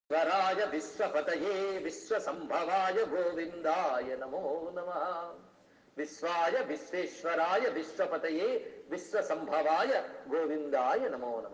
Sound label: Krishna mantram